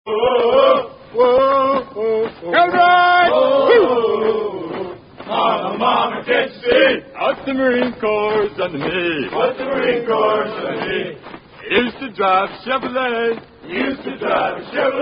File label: Marine Cadence; 1986; heartbreak ridge